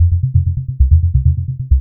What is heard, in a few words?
INST LOOP, House Side Of 2 Step; USB Soundscan vol.51; AKAI